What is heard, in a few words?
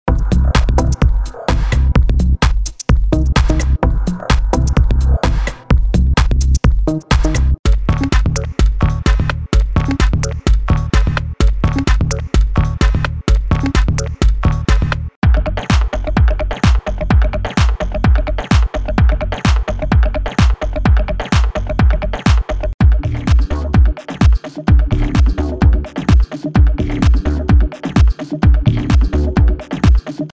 Drumcodes